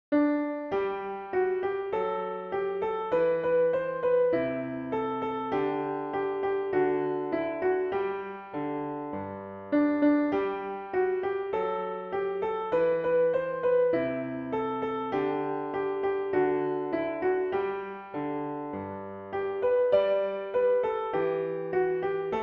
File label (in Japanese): ピアノ